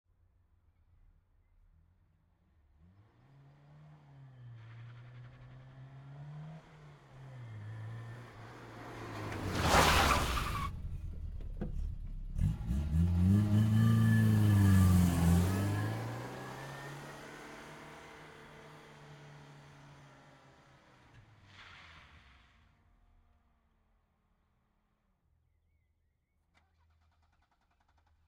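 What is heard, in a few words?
Saab 99 exterior fast approach stop reversing away passbys; SAAB 99 GL 1976; ORTF; Pole Position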